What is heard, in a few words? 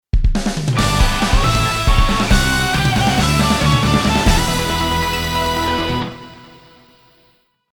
Dungeon Win BGM; closers